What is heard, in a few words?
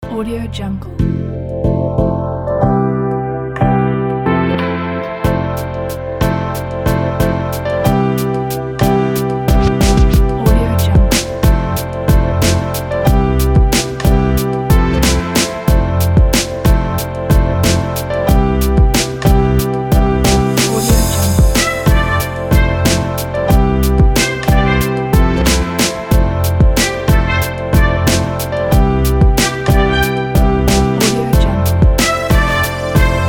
hip hop background